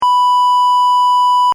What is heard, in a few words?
Hospital flatline loop, Heart Monitor Beep: Constant, Indicating Flat Line; Electronic; Miscellaneous Electronic